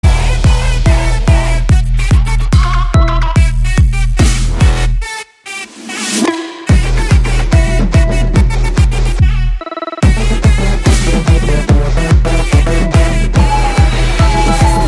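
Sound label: background music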